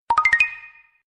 standartnoe sms na samsung galaxy s4, rington sms; prikolnyy rington sms

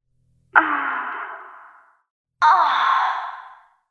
chuvstvennyy zvuk na sms; poshlaya sms

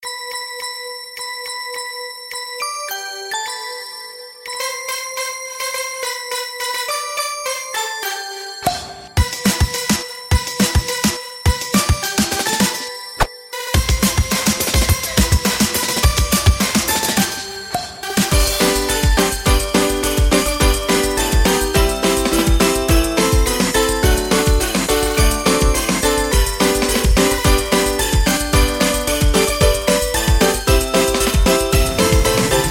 novogodniy rington

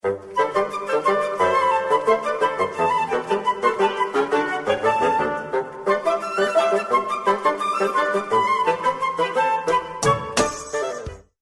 multiplikacionnoe sms zabavnaya melodiya sms na telefona

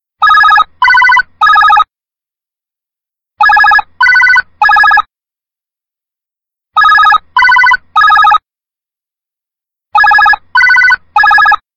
zvonok; zvonok zoom zoom; sereznyy zvonok

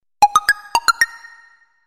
intriguyuschiy lyubopytnyy zvuk na sms soobschenie

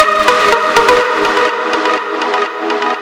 sms ring otryvok muzyki dlya opovescheniya mobilnyy telefo

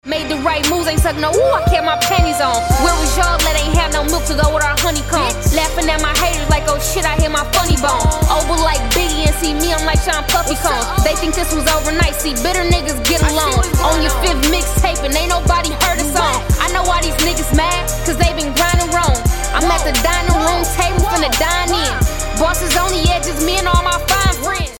devchonka chitaet repchinu amerikanskaya hip hop; amerikanskaya hip hop diva

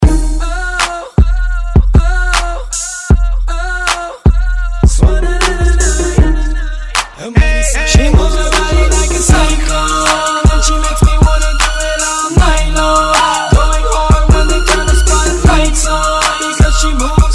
moschnyy bit i bass; na zvonok tvoego mobilnogo

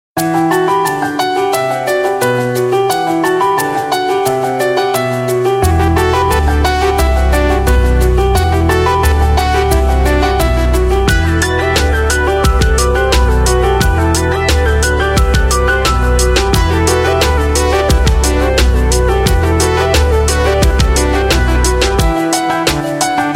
standartnoe sms na nokia lumia 1020 klassnaya melodiya sms

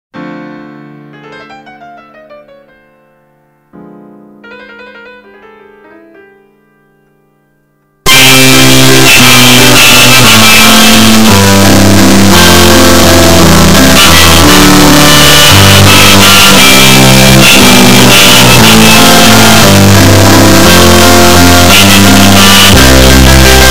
piano grustno gromko i tosklivo zvuk; piano mem

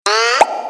ooo chpok

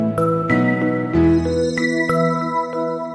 standartnoe sms soobschenie na samsung galaxy note 3

